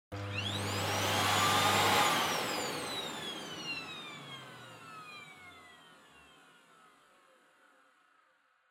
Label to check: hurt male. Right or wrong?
Wrong